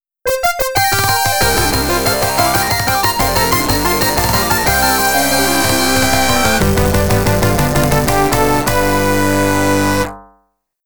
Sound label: Introductory Fanfare; contra special disc; rocket knight adventures 2024